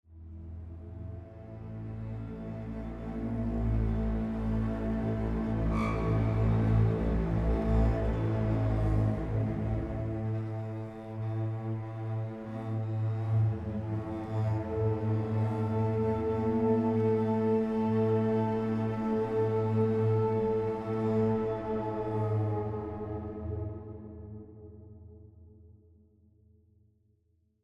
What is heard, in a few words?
Tension Block; The Last of Us